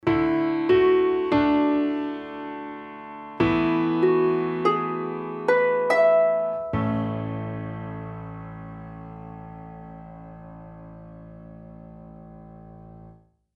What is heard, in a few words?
Jingle